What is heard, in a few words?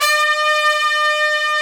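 BRS TPTS, brass trumpets section f; Roland L-CDX-03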